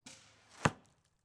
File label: arrow into target